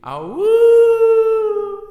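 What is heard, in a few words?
wolf howling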